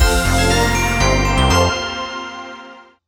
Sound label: win; Amatic; Book of Fruits